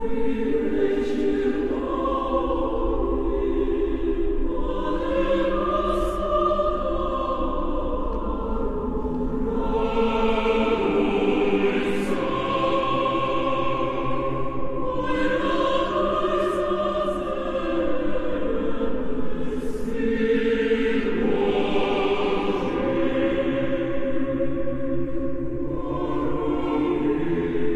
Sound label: Russian Christmas carols; Anonymous (Russian); Four Russian Christmas carols (1 & 3 sung in Ukrainian)